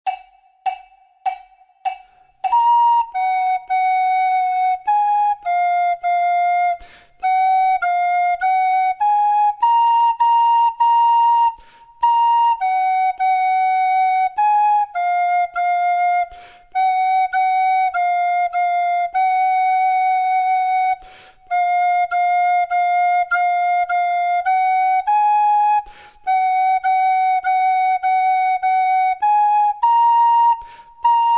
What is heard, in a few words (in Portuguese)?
DUETO FLAUTA; DUETO 4; LIGTHLY ROW